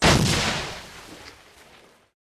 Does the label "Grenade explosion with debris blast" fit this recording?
Yes